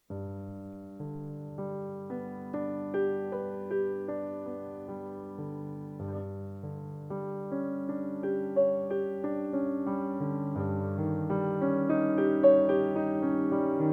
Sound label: piano solo; Carbo, Salvador; The shepherd speaks in the mountain